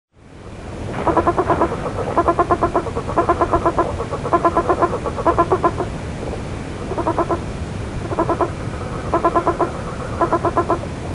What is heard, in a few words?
Dyscophus antongilii